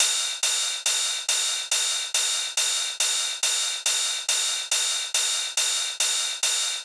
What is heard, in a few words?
drum Loop 140BPM Ride